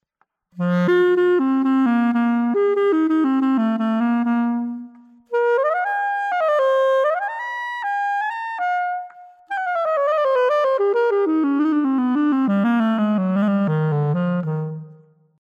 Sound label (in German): Klarinette